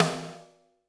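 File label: snare drum, Drums; Bob Clearmountain Drums I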